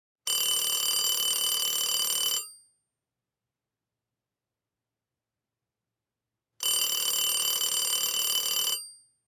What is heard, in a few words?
Old phone ringing